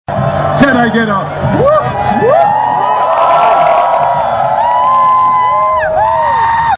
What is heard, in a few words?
Game Day Whoop